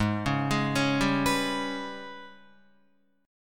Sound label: Ab Minor Major 7th Double Flat 5th, down, Guitar; AbmM7bb5; arpeggiated